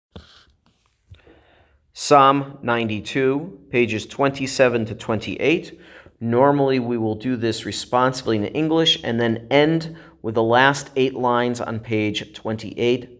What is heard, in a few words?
Psalm 92 Tzadik Katamar